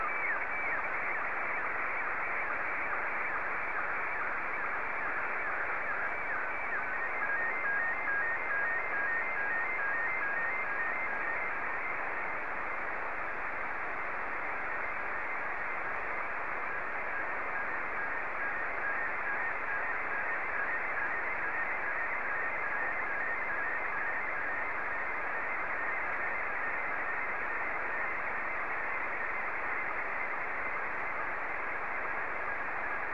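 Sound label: SSTV, Scottie 1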